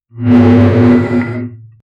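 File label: deep guttural demonic death, warrior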